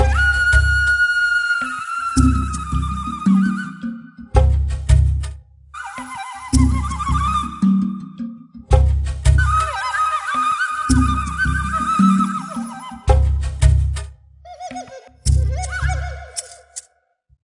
Jungle Level

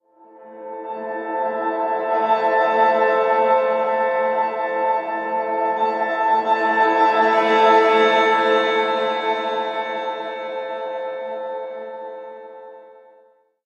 Map Piano; No Man's Sky

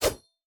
Melee; boomerang fu

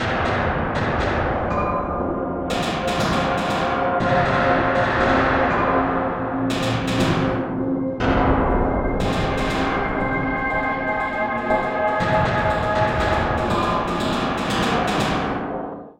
Success Tonal A4; Insanely Twisted Shadow Planet

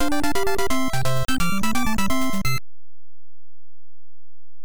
Level Cleared Dance (Short); Kirby's Adventure

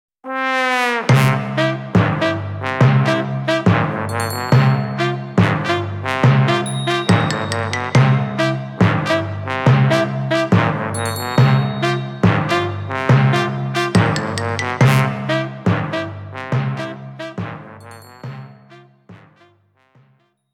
game over jingle; Midnight Carnival; LocoRoco Midnight Carnival

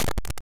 Stone Break

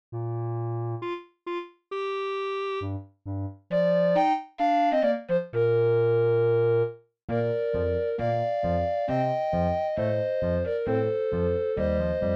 Roland SC-88; SimCity 2000

